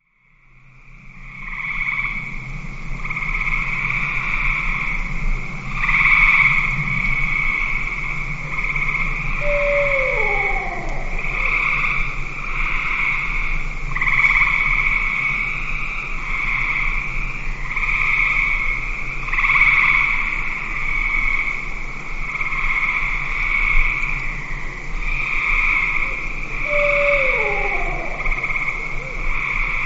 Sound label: barred owl